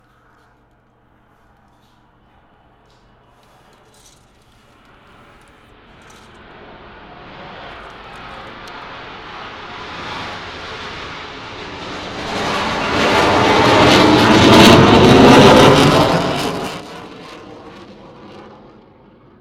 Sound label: Military Jet Over Sunnyvale, binaural